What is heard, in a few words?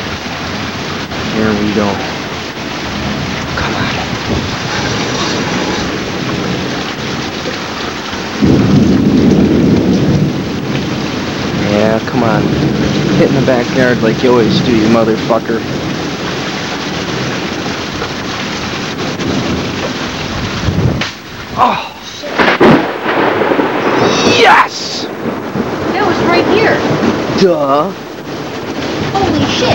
zap